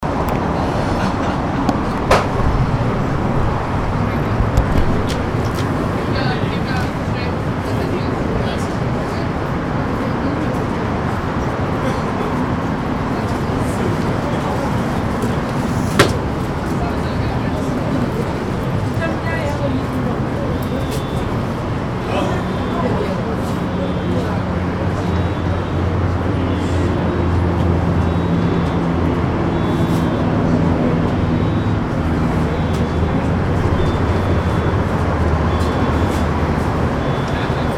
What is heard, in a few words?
Park Seattle WA; Victor Steinbrueck Park